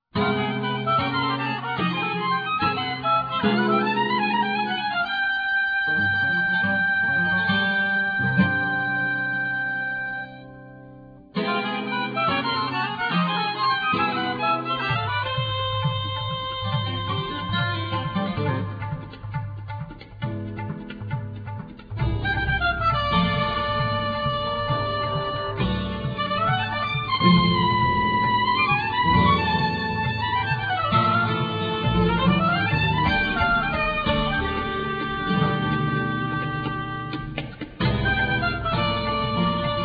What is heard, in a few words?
violin; italy